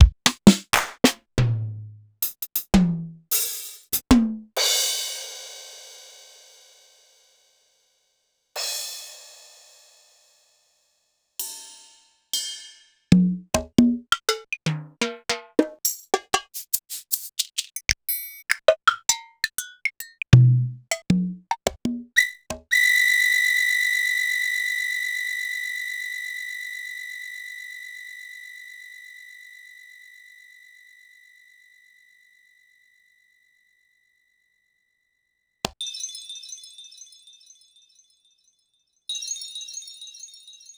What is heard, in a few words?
Korg 01W Percussion; Sliced